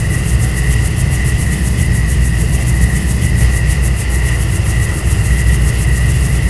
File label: Ambient; Jump Zampoli